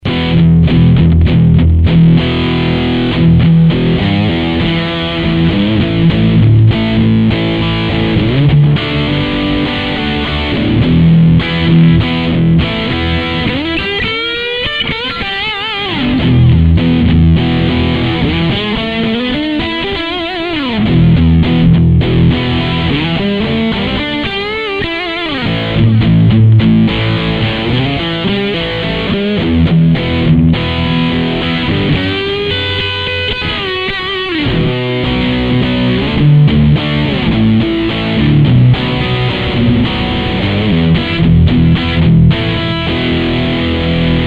Boogie Dual Rectifier

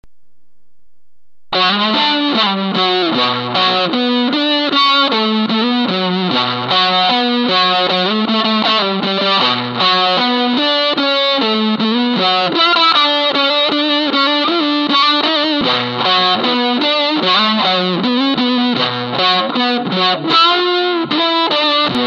Darkside Rock Tube Zipper

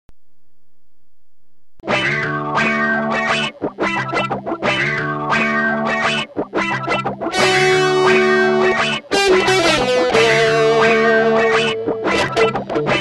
Graphic Fuzz Tube Zipper

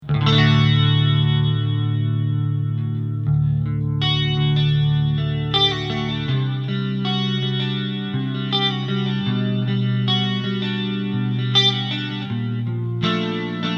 Clean

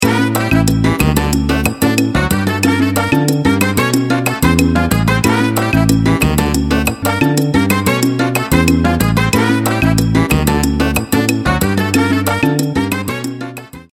MC-909 mambo

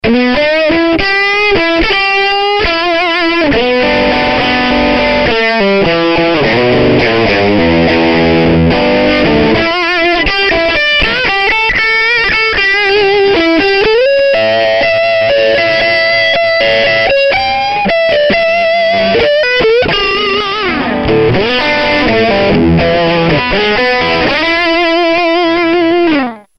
Boogie Mark IV

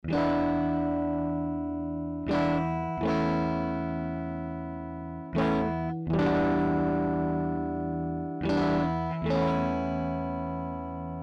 the envelope control

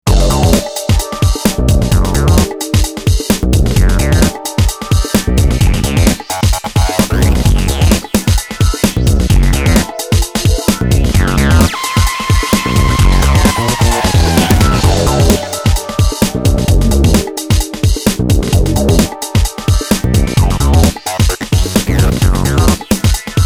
EMX Jazz

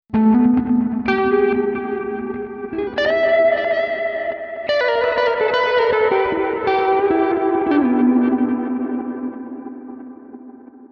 Reverse Spacious

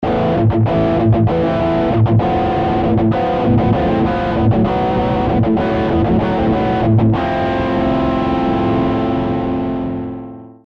Big Mean Scoop

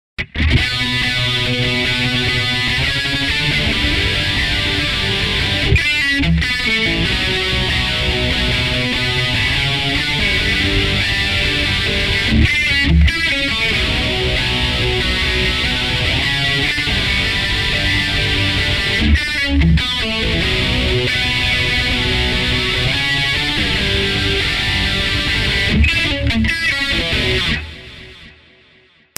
80s heavy

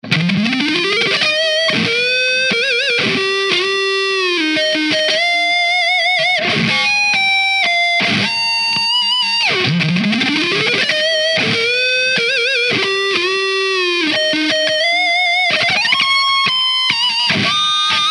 Dire Violin Singing Strat

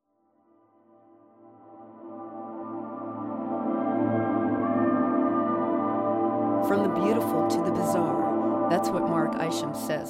tonal

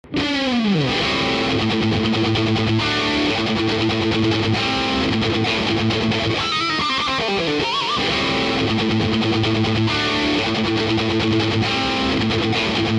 Blizzard Tone

